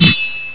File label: big sword; Ronin